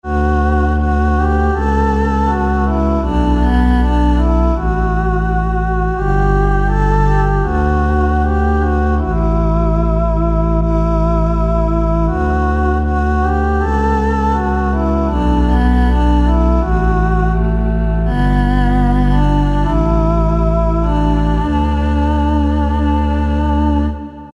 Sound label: Solo Voice, Alesis Z3; Sanctuary